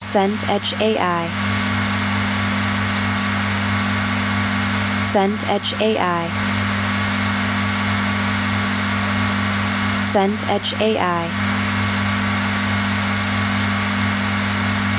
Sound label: room tone corridor